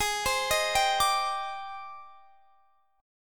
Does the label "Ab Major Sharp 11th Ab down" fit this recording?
Yes